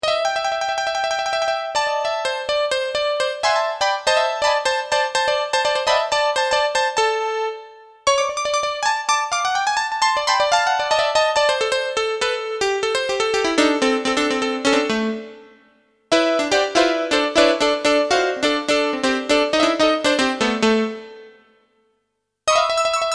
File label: piano